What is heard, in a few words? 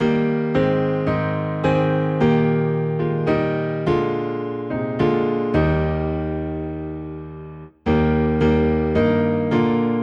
Piano Low in D Flat; Whiter Than Snow